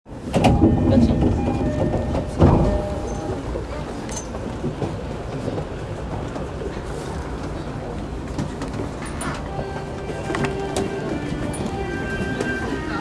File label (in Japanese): JR東海道線辻堂南, train sounds